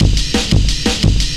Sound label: Rock Amen; Jungle; Breaks; Blu Mar Ten - Jungle Jungle - 1989 to 1999 Samplepack